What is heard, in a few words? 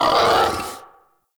Battle Dog Pain; Animals; Vocals